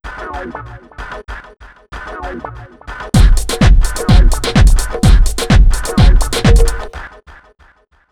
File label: 127, Disco House; USB Soundscan vol.20; Fresh Disco House I; AKAI